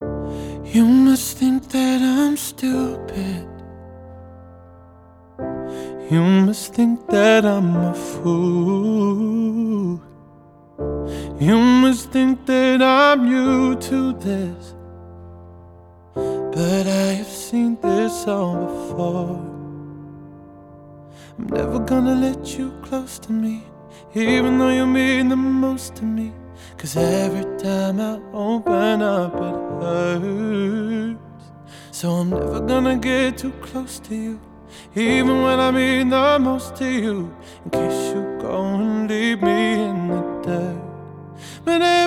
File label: acoustic